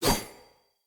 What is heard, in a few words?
Dagger Whoosh; Weapons; Swords; Fey